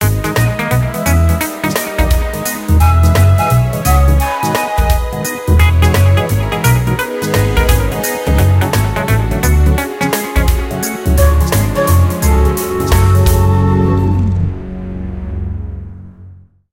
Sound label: Stinger Sinking; tales of monkey island